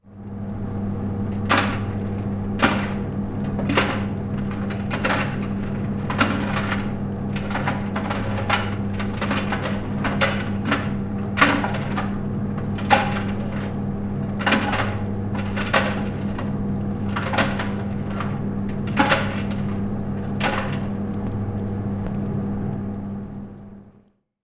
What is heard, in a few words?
Boiler rattling